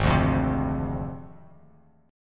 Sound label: Ab 6th Flat 5th Ab, Bass Standard; strummed; JGuitar